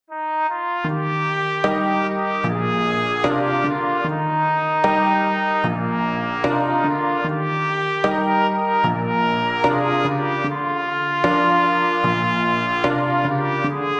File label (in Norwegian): nordmannen for trompet og piano in B; 1 vers; folksong